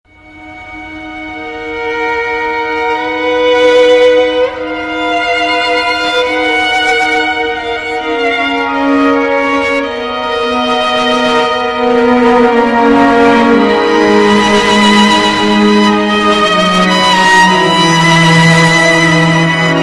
Gothic Tonne

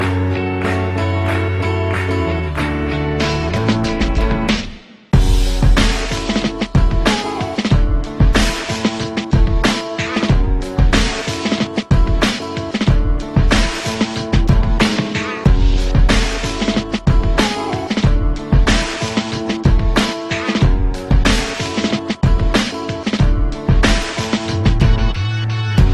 Instrumntl

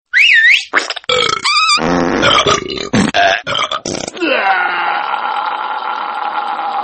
Fart Burp Mix

